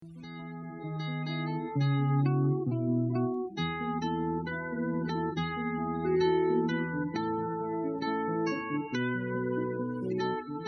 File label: Etude in E Major